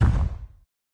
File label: thump; Zomboid 41.78.16; Project Zomboid